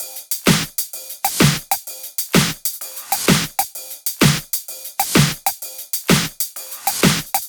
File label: 128BPM Kit; Vengeance Samples; VFH1 128BPM Tron Trio Kit